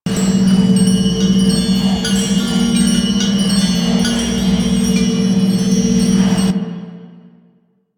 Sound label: Idol Loop; Pazuul; Warframe; Quests; Veilbreaker